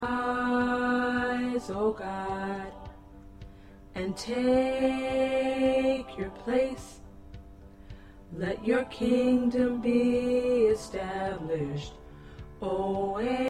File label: Tenor